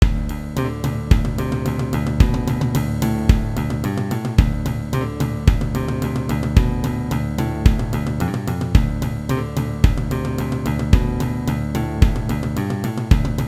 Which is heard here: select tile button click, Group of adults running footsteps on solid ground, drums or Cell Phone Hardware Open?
drums